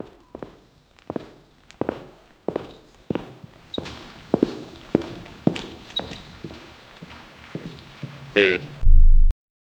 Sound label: loud elephant trumpet with footsteps, savannah